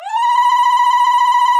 Zaghrouta Loop

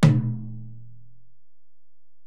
Tom, Alesis DM-PRO; Toms

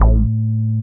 DR. LOOP, BASS; X-Static Goldmine 3

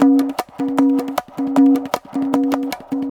Pure Tabla, 155BPM; Zero-G

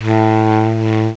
SAX, REEDS; CMI Sound Library Rev 1.3

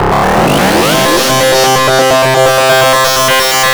GRUNGE

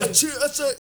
M FX FILL, MALENOISE; Spectrasonics; Heart of Africa 1

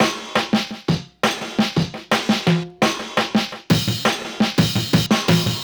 4 Bar Roll 170, Drum&Bass Breaks; EZ Rollers

